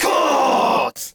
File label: mens katsu